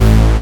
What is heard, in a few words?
Offbeat Bass A; Vengeance Samples